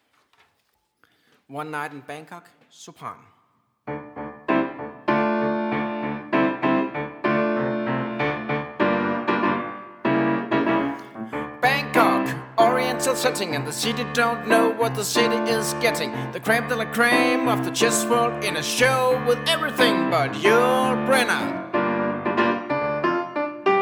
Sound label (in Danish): Sopran